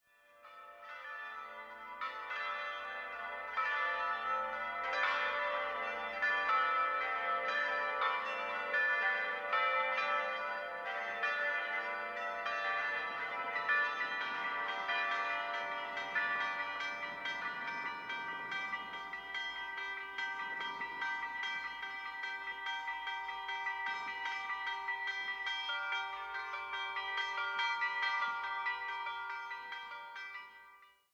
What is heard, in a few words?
Church Bells